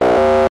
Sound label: science fiction computer console negative feedback; Skyclad Sound